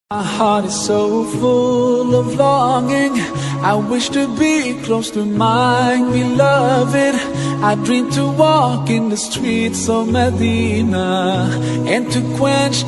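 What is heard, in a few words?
english islamic ringtone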